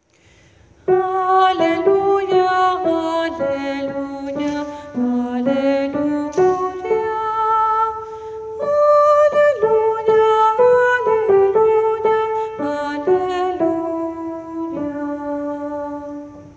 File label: alleluia soprano